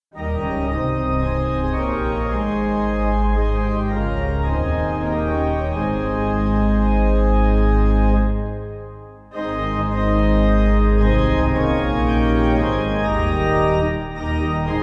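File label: pipe organ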